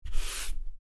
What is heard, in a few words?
Chalk eraser swipe; Chalk and Chalkboard